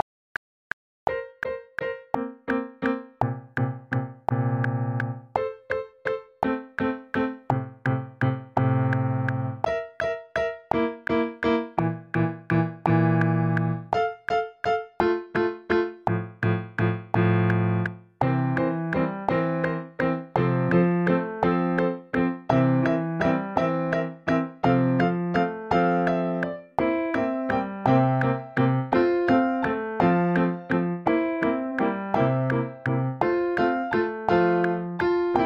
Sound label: Chopsticks with metronome, piano